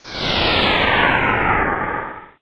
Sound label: wind; Yahoo! Messenger; leaves